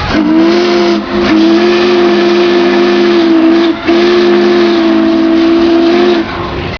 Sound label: steam